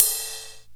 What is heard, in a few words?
RIDE